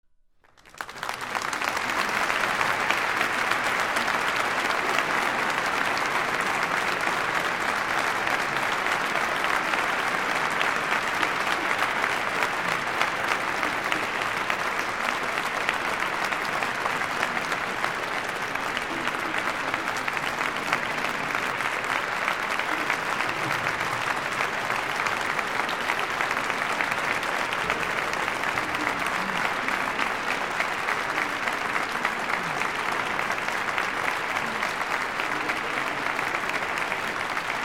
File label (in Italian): APPLAUSI FOLLA CORO POLIFONICO